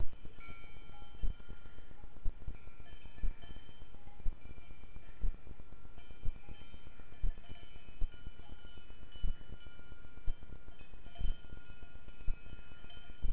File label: bells